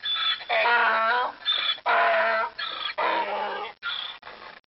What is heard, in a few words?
Donkey sounds